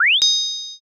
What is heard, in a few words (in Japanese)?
kiraan